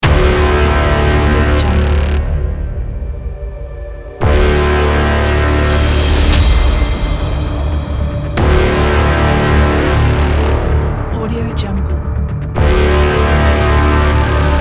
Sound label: epic trailer